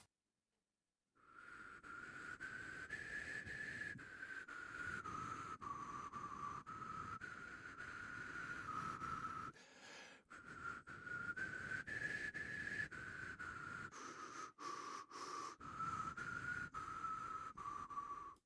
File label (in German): rauschend gepfiffen; Hoertest Tonbeispiel